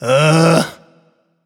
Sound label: nv ugh; cupid